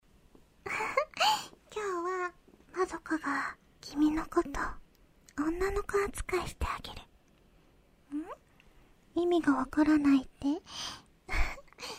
耳舐め ASMR

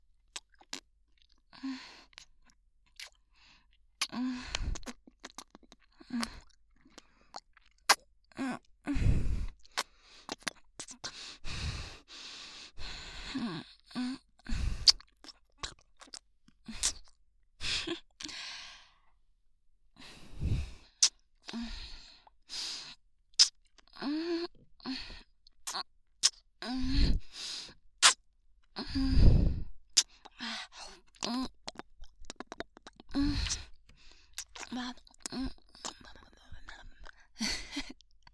キス, ASMR

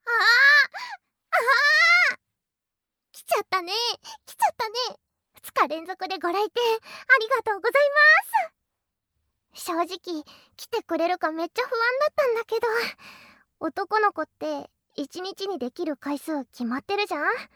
レオタードでサウナ (耳舐め膣内射精), ASMR

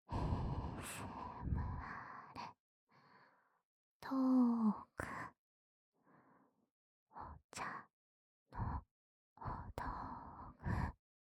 ASMR トーク 茶道具

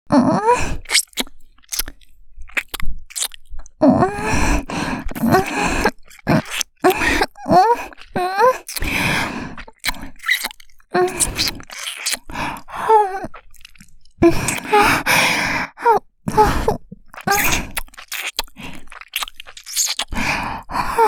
リップキス, ASMR

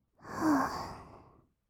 "はぁ", kawaii voice; 1200 kawaii voice!!; 色々なワンショット

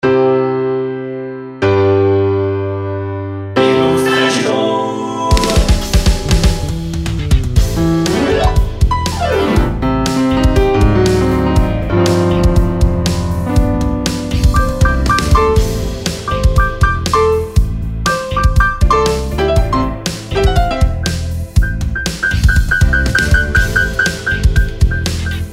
ピアノジングル; 効果音素材集; ぴぐみょん効果音素材集033; ぴぐみょんスタジオ

